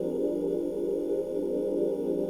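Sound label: ui holograph ambience cybernetic loop; Stellaris; paragon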